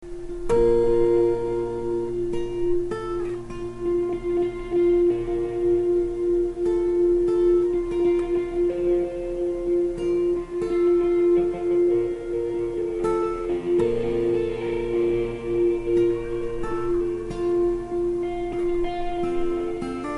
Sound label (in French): piece pour deux guitares